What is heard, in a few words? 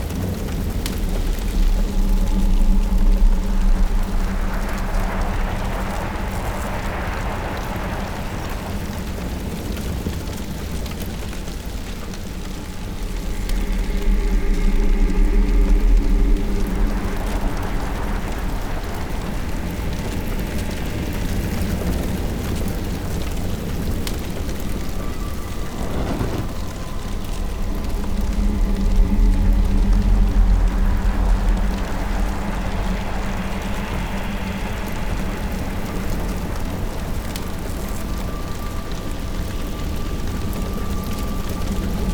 Village Ambience War; hero defense haunted island